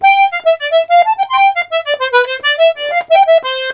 BOUREE, Accordions; USB Soundscan vol.40; AKAI

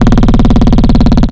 Jackhammer Kick; Cwejman S1; DevSnd Cwejman S1 - JK

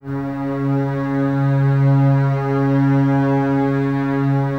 Basses C#3, String Ensembles; Miroslav Vitous; 90 s Sample CDs

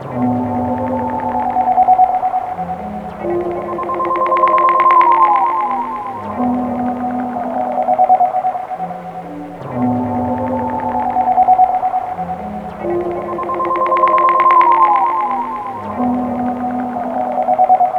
Suspended Tones; Sonic Foundry (Sony Creative Software); Loop Noir

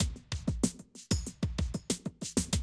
Classic DrumLoops

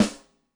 Brass, Yamaha DTXpress-II snare

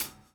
DOWN, DOWN KIT 78

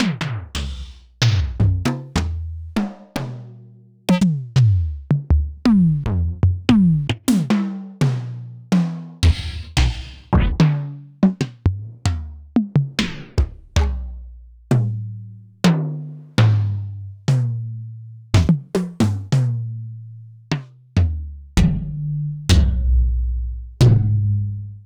Akai XR-20 Tom

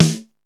SNARE, DrumBase5000; Crypton; CFZ KIT 2

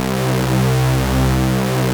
OB C3, Rare Synthesizer; Hollywood Edge - Giorgio Moroder Rare Synthesizer Collection; OB 4 VOX

